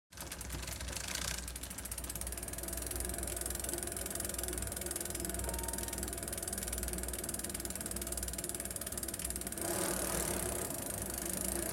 Bicycle pedaling medium speed close perspective to chain